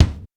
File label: Roland U220 Dry Set